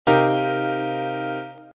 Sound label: Cmin7 11